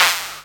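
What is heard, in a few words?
percussion FX, Dance Drums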